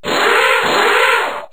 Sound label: drill sound